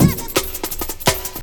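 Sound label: breaks no quantisation, JUNGLE; M8JUNGLEPAK1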